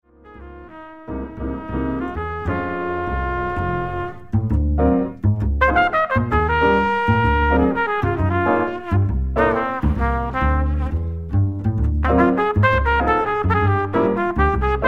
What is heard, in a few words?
minus drums solo